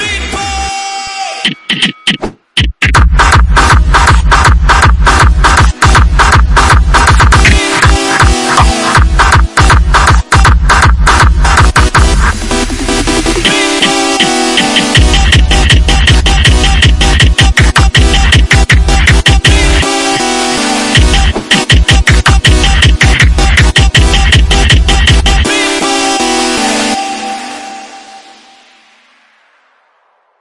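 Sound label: ping pong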